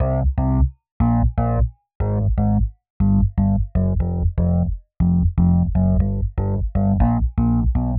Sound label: Rhodes Bass C; PowerFX - Nu Jazz House